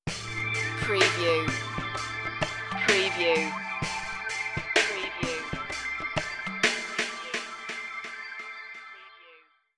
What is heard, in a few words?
slowboat stinger